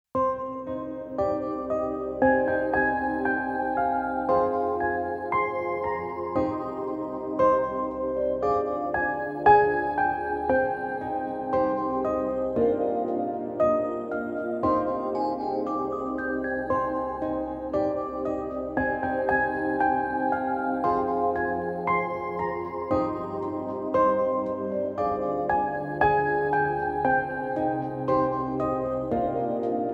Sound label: Spooky One, suspense eerie